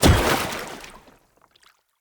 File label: Blunt Heavy Impact Water; Weapon Impacts; Player; Environment